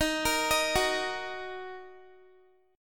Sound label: D sharp Minor, Guitar; D#m; arpeggiated; x,18,20,20,18,x; 4E,4A,5D,5G,5C,6F; chord shape